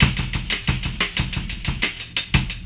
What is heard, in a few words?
Process Beat, Hip-Hop Beats